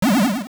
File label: Appear Vanish; Various objects appearing or vanish; also Ganon's large charge-up shot firing, Ganon's staff-shot expanding; Zelda Oracle